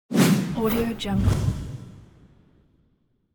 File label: fast swoosh transition